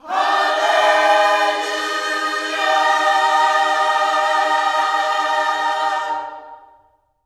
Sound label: HALLELU, Gospel; HALLELUJAH